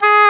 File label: oboe dry; Roland L-CDX-03 Disk 1; Oboe 4-8